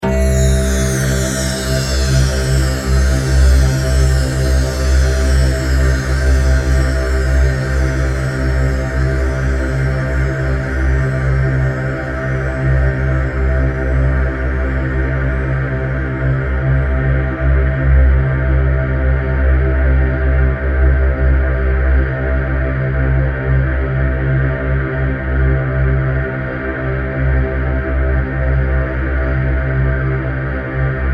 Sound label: Drone Vox Chimes, Drone, Vox, Chimes, Spooky, Horror; 5.1; Sound Effects:Science Fiction; BlastWave